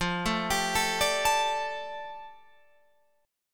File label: F 6th Add 9th F down Guitar Open F, chord; F6add9 arpeggiated